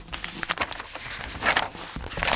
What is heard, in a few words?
newspaper